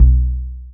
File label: Elc Bass; Equipped Music